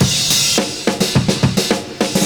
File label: CYMB, Breakbeats